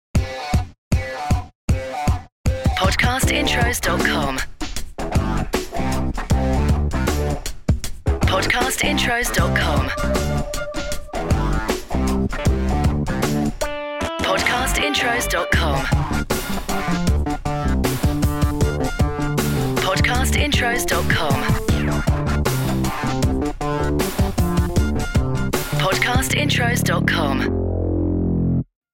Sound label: HIP HOP High Timez; Podcast Watermark